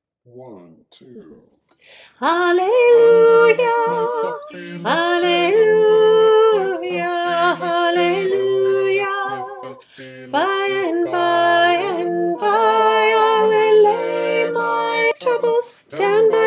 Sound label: halleluja refrain in f alto